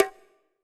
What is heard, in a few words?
bongo hi; Greytsounds; Scott Peers Sound Engineering